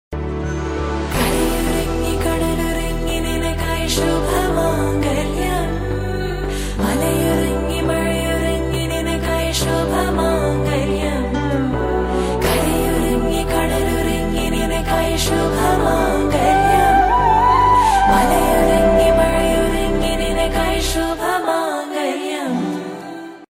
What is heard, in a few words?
tamil ringtone